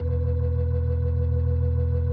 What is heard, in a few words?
E1F, B3, Vintage Keyboards